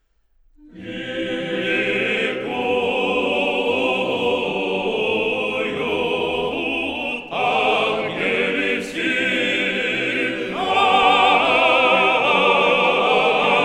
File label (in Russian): Хор Валаамского монастыря